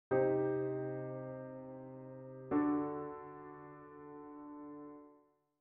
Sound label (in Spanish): semi sol m; cadencias